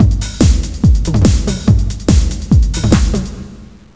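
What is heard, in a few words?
drum loop; Descent II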